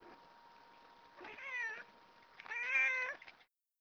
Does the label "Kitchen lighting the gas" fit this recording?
No